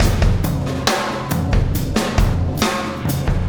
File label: Impact Crater Beat, Processed Drumkits